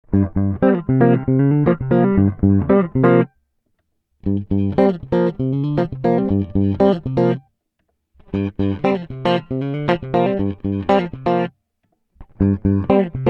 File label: bass lab guitar